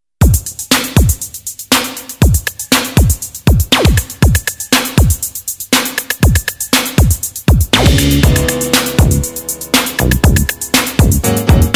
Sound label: Rain Forest, smooth jazz